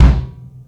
Kick, Kick One-Shots; KJ Sawka - Drum n Bass & Beyond; Drum Tool Box

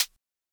closed HH, Univox SR-95

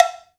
Cowbell Low, Roland BK-5 Percussion

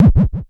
KLONE XMOD, Dual Percussion Synthesiser

